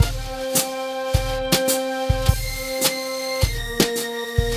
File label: Lounge Atmospherez